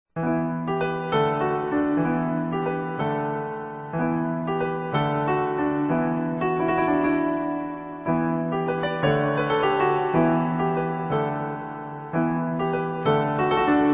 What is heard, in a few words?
score sample; Voices from the High School